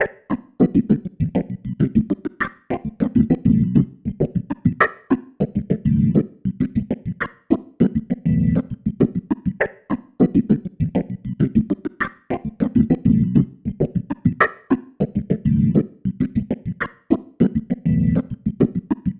Bubblin, Organ; Sonic Foundry (Sony Creative Software); Joe Vitale Organ Donor

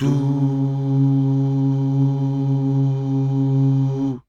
DUUH

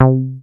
JUFAT C4, BASS; Q Up Arts; Dream Experience

